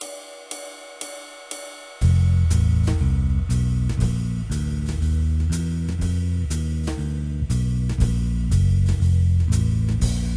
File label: F Blues